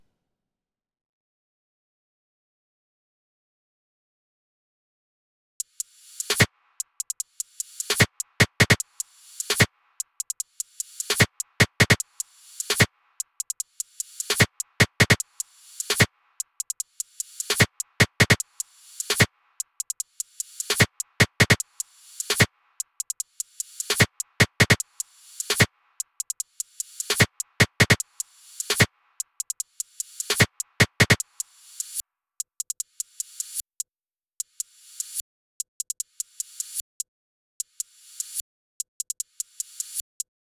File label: perc